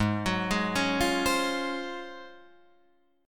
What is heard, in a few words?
Ab-13th-Ab Guitar Drop D, Ab13 chord; arpeggiated; JGuitar